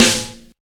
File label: rich top end snare one shot a sharp key; snare drum samples